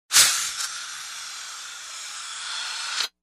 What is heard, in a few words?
Звуки пара Sounds of steam